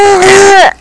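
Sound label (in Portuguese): SATISFAÇÃO, SONS PEDIÁTRICOS; PEDIATRIA UFF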